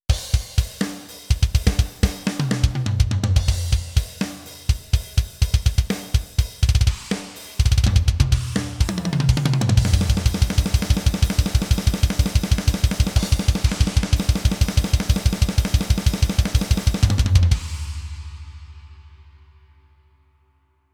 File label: Drums Mixed Normal